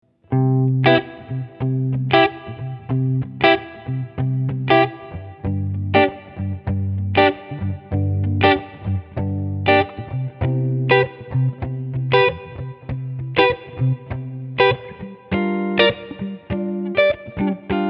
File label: room, big sky; strymon big sky; Mellow Out; Reverb